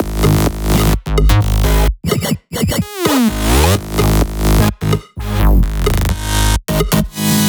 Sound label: 128BPM Synths Root G#; Speedy; Vengeance Samples; Speedy Kit